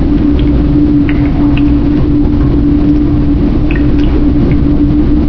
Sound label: alien cave, ambience